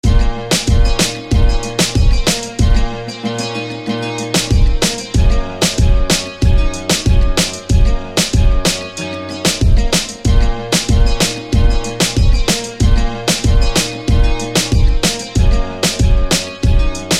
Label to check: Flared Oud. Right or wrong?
Right